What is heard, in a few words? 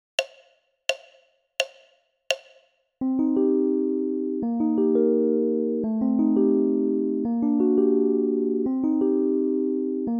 Piano